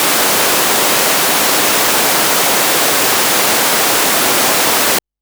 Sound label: Modular Raw, White Noise Raw; noise hiss crackle